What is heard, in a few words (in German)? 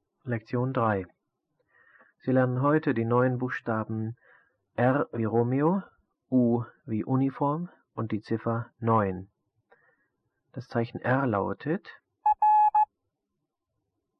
Morsen